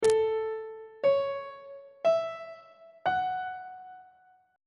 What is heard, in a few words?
a major 6th chord intervals on treble clef